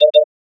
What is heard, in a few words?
double Beep